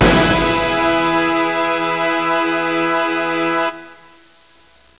FANFARE E, ORCHHITS; MUSIC

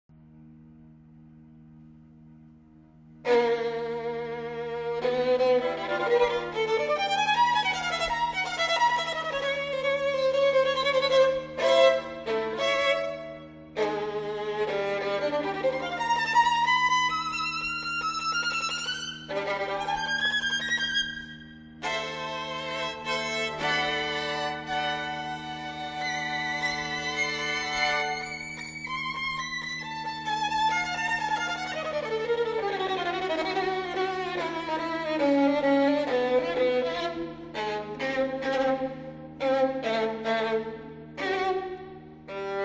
String Quartet in E flat Minor; Dmitri Shostakovich; Complete String Quartets

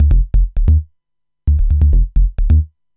bass, dance

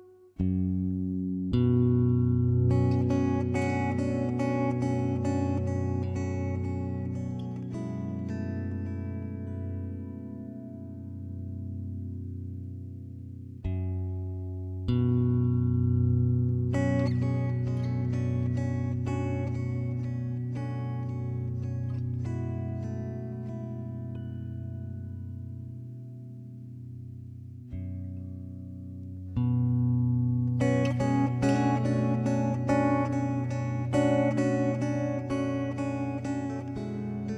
Instrumental

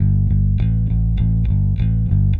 Classic Rock Bass

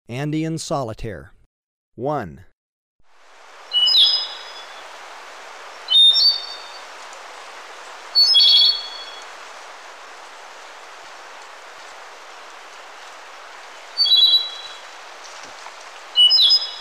Andean Solitaire, Birds of Ecuador; S. America; Natures Songs

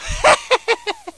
HEH HEH; HUMAN

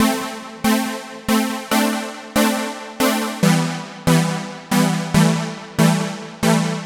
Synth, Synths Lead; Dance

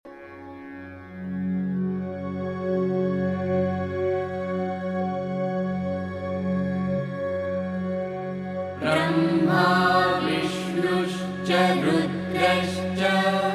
Tilak Mantra, Vedic Mantras; Indian - SIKH; Collection of Vedic Mantras (Rare Mantras)

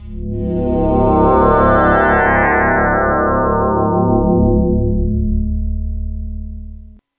Nu Message; Dance; SFX

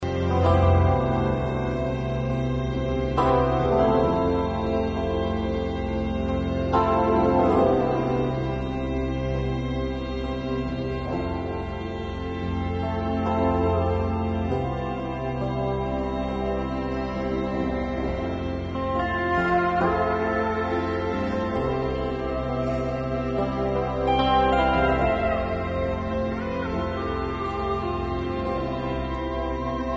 Quartet, Electric Piano

